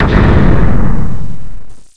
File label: snap; jewel